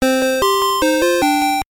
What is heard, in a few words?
Buzzed In First - Jingle